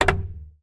Door Handle Wood Clack

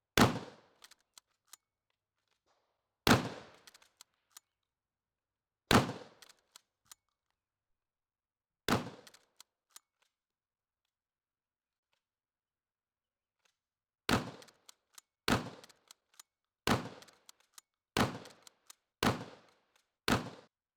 Shooting A Shotgun

